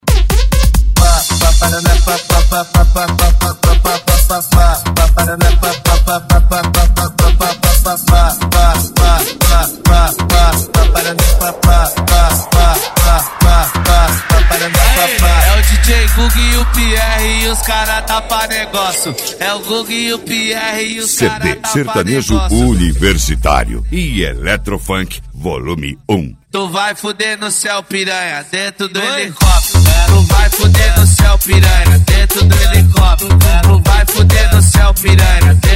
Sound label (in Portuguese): eletro funk e sertanejo